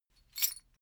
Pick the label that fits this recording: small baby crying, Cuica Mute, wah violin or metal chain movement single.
metal chain movement single